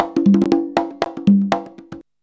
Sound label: CONGA 119